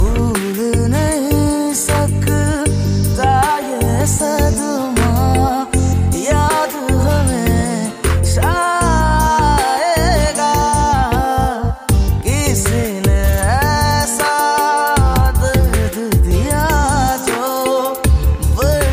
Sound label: broken heart breakup bollywood hindi music ringtone